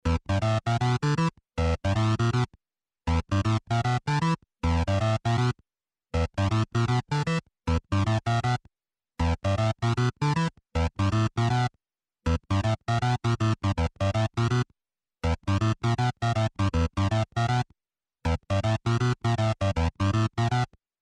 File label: Game Faster Another Jump Loop